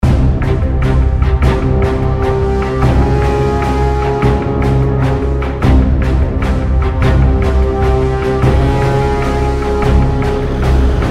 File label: Mx Courtyard Combat Intro